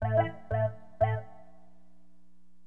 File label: WAH, Keyboards; HipHop; LiveAct Pool 1